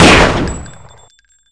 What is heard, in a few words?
pistol; weapons; KINGPIN